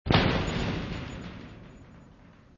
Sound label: bang